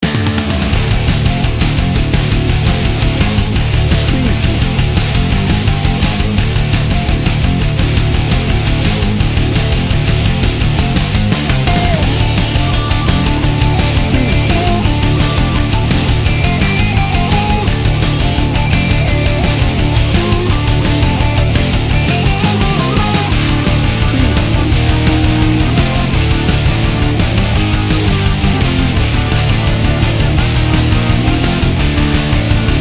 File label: metal rock; Fast, powerful, Heavy Metal – Hard Rock. Driving distorted guitars fueled by driving bass